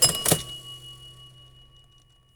cash register

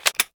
revolver reload

